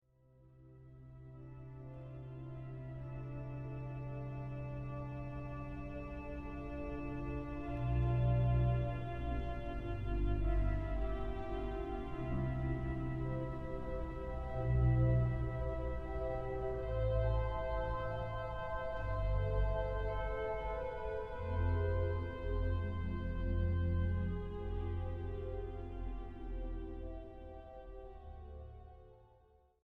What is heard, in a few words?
Berceuse